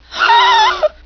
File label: pain